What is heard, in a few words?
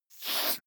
nose sniff; soundpool